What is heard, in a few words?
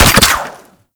Grapple Fire